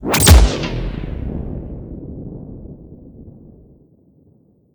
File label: Complication Alert; Plastic Surgery